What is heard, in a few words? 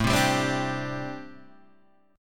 C Suspended 2nd A; strummed; chord; JGuitar